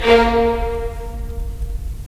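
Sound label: strings violins, left; Roland - LA Composer vol. 4; 90 s Sample CDs; Violins Sh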